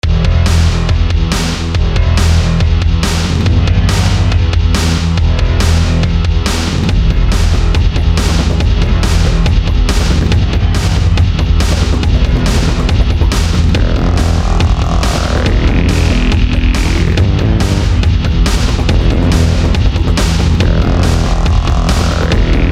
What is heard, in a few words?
swift grunge